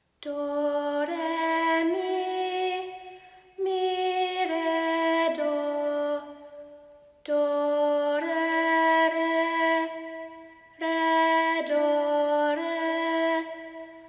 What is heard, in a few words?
doremi